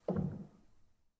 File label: rel, Grand